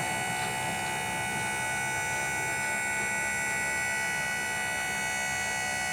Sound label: winch Small Motor; Kerbal Space Program; KAS